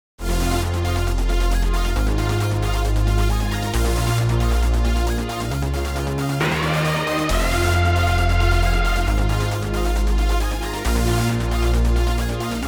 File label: synth stack; duel lt by audiorift